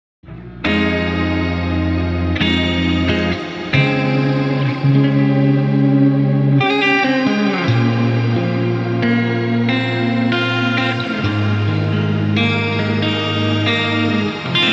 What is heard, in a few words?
synth fx